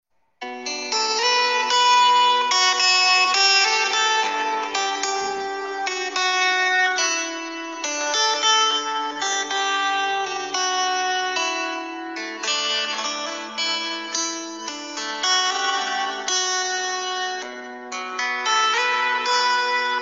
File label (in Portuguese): toque para celular louvor